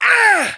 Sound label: pain